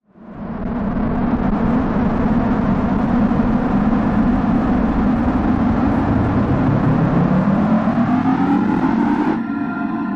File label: Star Field Background Noise